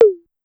Tom 808 Down Fast, Pitch Env Various, Clavia DDrum; Clavia DDrum-AT